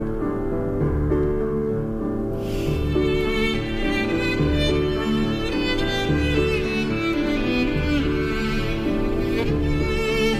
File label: Violin and Piano in D